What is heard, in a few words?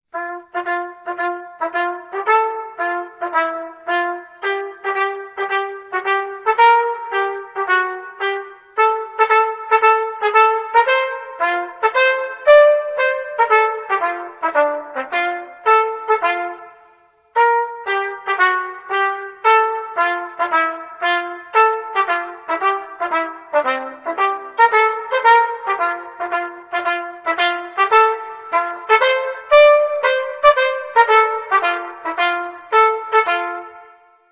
trumpet; Arban